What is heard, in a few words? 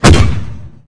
Weapons Rocket Launcher; unreal development kit